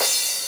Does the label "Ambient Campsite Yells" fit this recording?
No